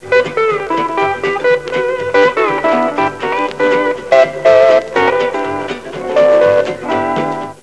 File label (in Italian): musica strumentale